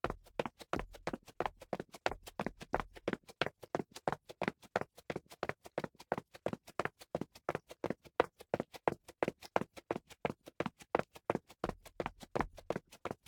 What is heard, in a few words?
Footsteps; LOOPERS; Tyler